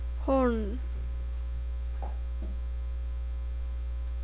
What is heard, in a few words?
horn